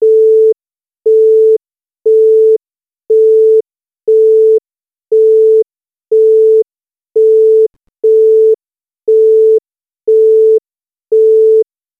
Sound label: bip